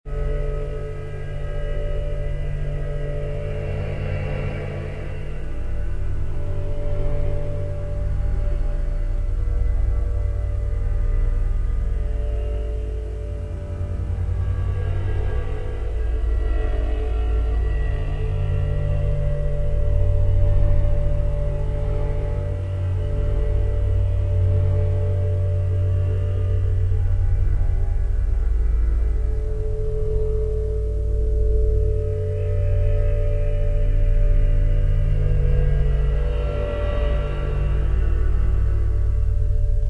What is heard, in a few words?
Distant Steady Drone